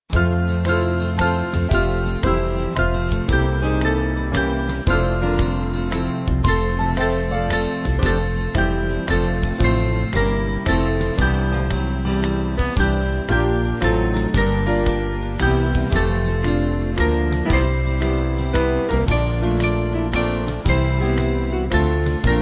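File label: Band, Free Music Backing Files for Churches; Sun Of My Soul; Hursley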